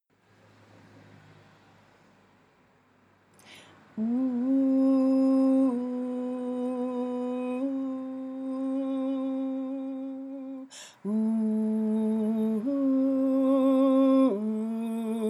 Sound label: verse with ooh low